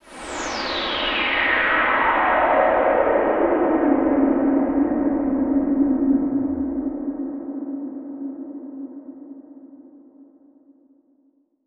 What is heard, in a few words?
Sweep; noise